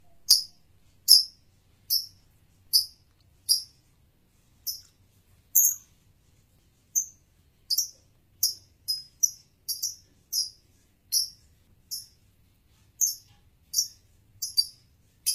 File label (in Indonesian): suara burung lovebird jantan